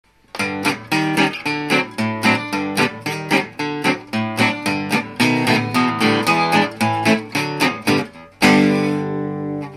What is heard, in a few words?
Chords